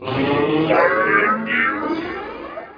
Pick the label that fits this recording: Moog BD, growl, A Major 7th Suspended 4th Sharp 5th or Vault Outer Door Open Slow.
growl